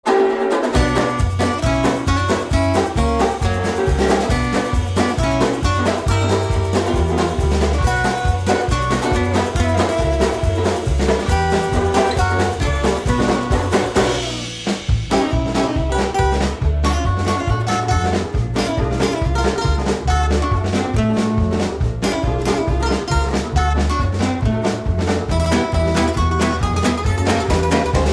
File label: Instrumental